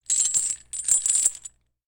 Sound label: Chain wrapping